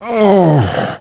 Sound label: dead, male; Pirates Of The Caribbean